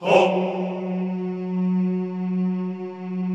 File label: CHO F#2, Vohmm Hohmm; Sound & Vision; Gigapack I CD 2